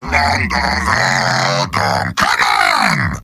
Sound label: heavy singing; mvm